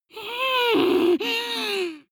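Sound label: witch moan evil, creatures; kreaturen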